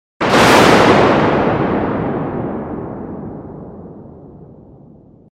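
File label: sound of thunder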